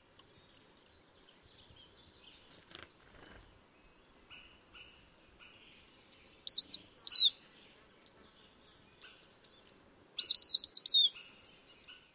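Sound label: songs calls, Brown Creeper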